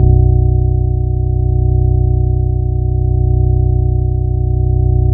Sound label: ROTOR BASS, Bass Analog & Synth; USB Soundscan vol.12; AKAI